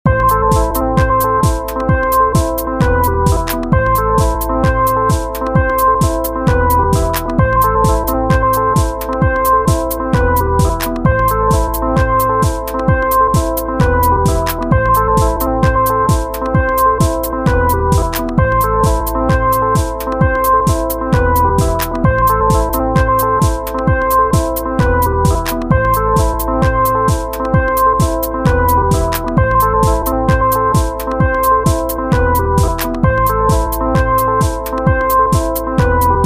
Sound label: cold house music